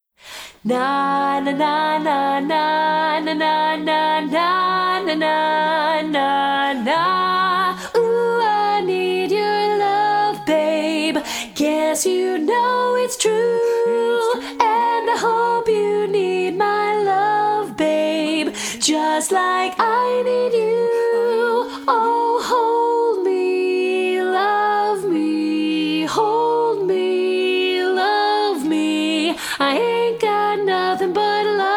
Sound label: Lead Dominant